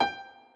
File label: Piano; Feel Demos; Snake